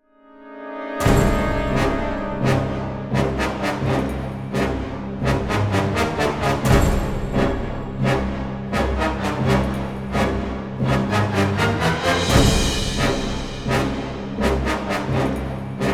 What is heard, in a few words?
bgm Find loop; monster hunter 4 ultimate